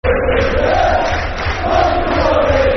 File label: De De Der, Football Chants; Michael Kightly; Wolves; wolverhampton wanderers; fanchants